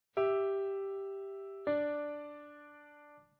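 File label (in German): verdeckte Oktave; Bedingt Erlaubte Parallelen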